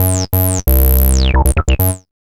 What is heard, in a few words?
B LOOP